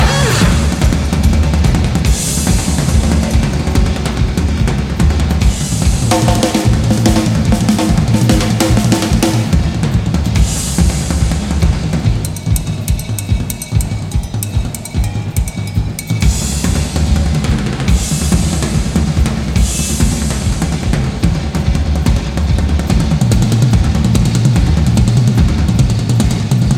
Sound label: Drum Solo, Live; Live At The Rainbow, London - March 1974